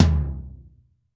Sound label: dry tom, acoustic drum